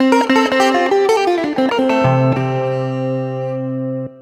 Ac 12 Str Gtr C free, Twelve String Guitar, African Guitars; Quazibeat - African Secret